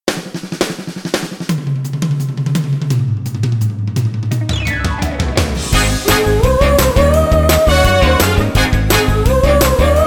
Karaoke

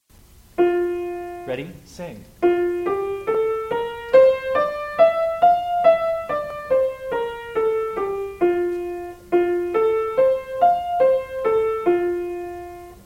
F Major louder

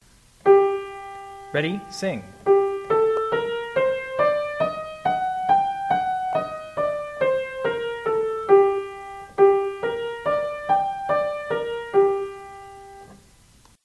G minor louder

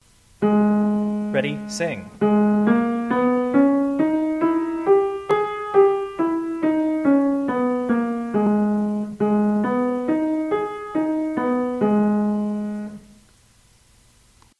Ab minor louder

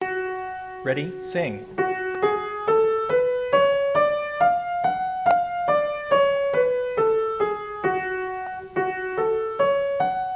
Gb minor louder

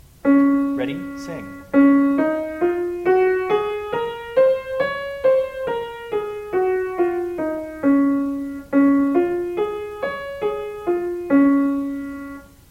Db Major louder

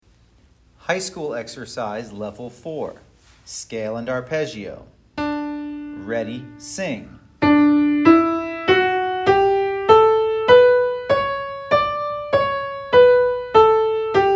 High School 4 in D

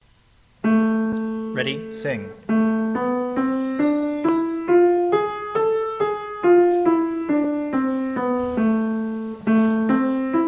A minor louder

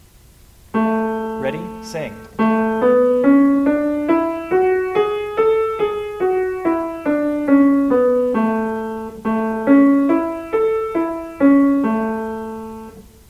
A major, scales

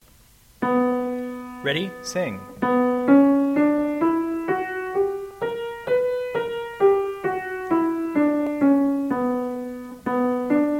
B minor louder

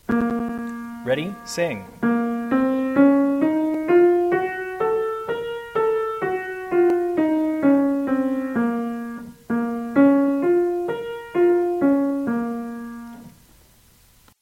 Bb minor louder

